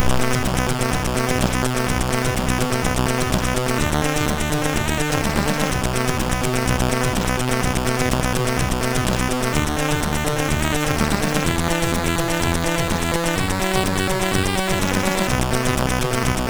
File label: Tune, sid MOS6581R2